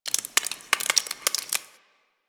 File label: Ice Thaw Drip; Ambience; Warframe